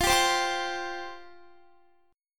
F Suspended 2nd F down Guitar; Fsus2; strummed

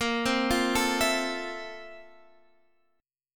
F Major 7th Bb; Fmaj7/Bb; arpeggiated

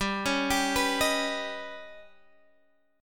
Eb Augmented 7th

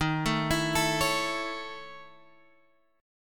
A Major D sharp; arpeggiated

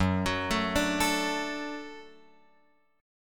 F sharp Minor Sharp 5th F sharp; F#m#5 Chord {2 X 4 2 3 5} arpeggiated

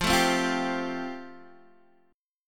F Major Add 9th Guitar DADGAD

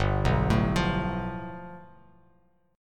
G 9th, Bass Standard; G9 arpeggiated

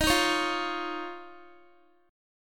D sharp 7th Suspended 2nd, Guitar; D#7sus2; strummed

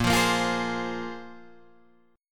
Bb 7th Suspended 2nd Bb, Guitar Open D

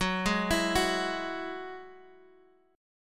Gb 7th Suspended 2nd Gb down; Gb7sus2 arpeggiated

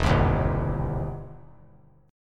E Minor Major 7th Sharp 5th, E, down; EmM7#5 strummed

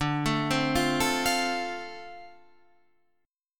F 6th D, chord

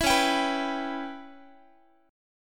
C sharp Minor 7th Flat 5th C sharp Guitar; C#m7b5 strummed; JGuitar

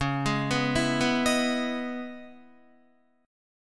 Db Minor 9th Guitar; arpeggiated; JGuitar

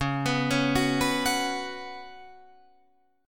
Db 7th Suspended 4th Db down Guitar Drop D

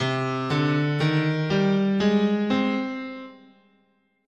C Hirajoshi, scale

